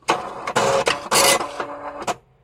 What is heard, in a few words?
time card